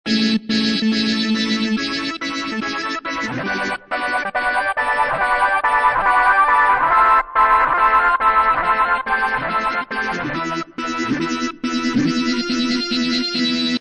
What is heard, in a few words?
Farfisa A maj; Loopology; Crying & Dancing; Techno & Dance